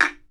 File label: BAMBOO, World Assortment